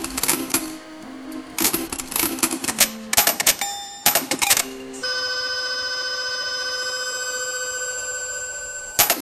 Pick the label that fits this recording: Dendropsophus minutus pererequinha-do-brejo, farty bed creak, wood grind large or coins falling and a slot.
coins falling and a slot